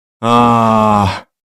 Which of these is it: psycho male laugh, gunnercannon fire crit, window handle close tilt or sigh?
sigh